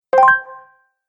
Game Quest Appear; Video Game Sound Effects

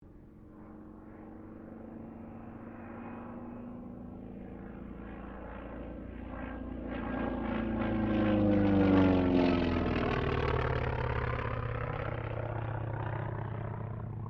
AIRCRAFT T-28D TROJAN PASS; Airplanes; Vehicles

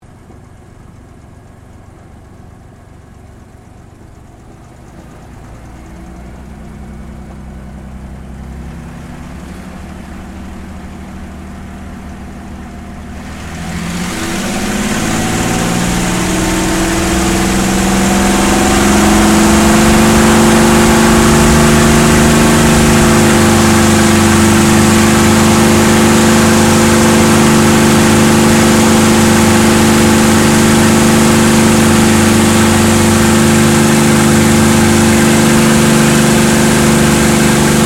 AIRCRAFT CAC CA-18 MUSTANG MK23 TAKEOFF INTERIOR; CA-18 /P-51 Mustang; Airplanes (Sound Effects:Vehicles)

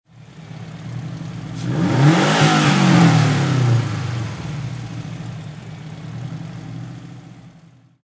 Subaru Sti Engine Single Rev; Auto, Power Car, High Performance Car Sound: Subaru STI. Engine perspective. Motor rev, engine revving; Race Car; Lynne Music

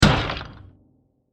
Small Wood Impact; Bursts; Impacts; CraftedSignals

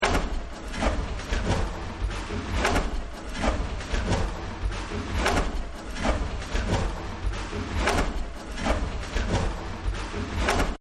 Factory; Factory 03

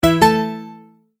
multimedia tone verified

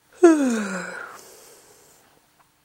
Yawning Female Yawn; human sound effects; Lynne Music

